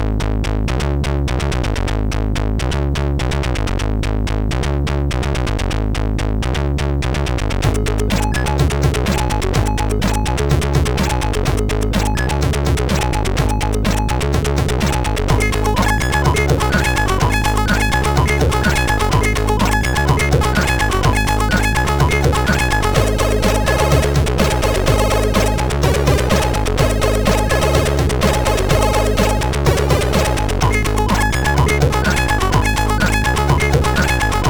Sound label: House Mix, MOS6581R4